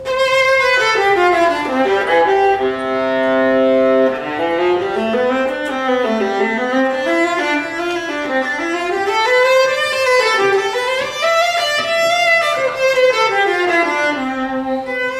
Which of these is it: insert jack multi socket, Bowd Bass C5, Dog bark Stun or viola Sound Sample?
viola Sound Sample